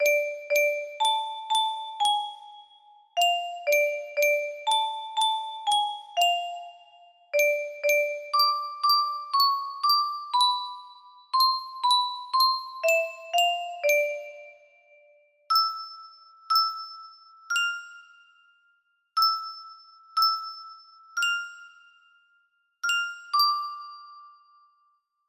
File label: russian lullaby, music box